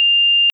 Bip, Train; FCMB 2000; OpenBVE

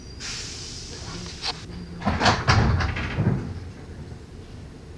Door Open, Train; Re460; OpenBVE

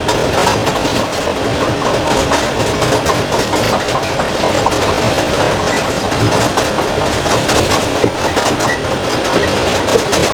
RUN, Train, R62 Kawasaki; OpenBVE

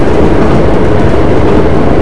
Run, Train; OpenBVE

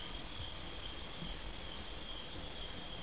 motor, train; OpenBVE

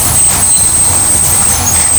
Flange, Train, R62 Kawasaki; OpenBVE

